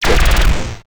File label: synthetic lightning explo; short decay